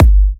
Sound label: G, kicks; markus hakala kicks